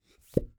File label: Plunger Pop On Wood, Household